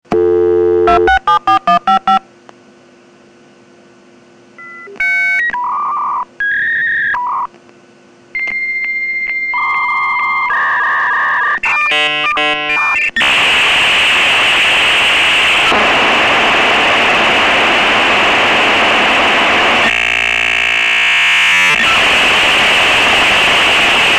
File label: Dial-Up Modem